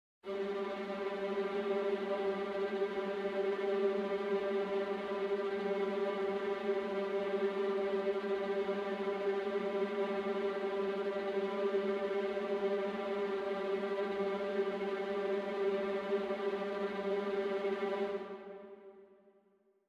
Dialogue Loop; BattleSabaku; Manago 2 Dialogue Loop

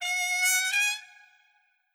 Trumpet

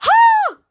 Cloth Combat Attack; gamerip; Hk

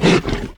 Monster Effort Small; Monster Ralph Effort Small

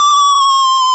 Kamikaze Fall

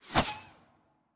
Pirate Bullet

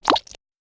Chaser Grab; gamerip

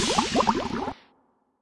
Pyon Small Dead

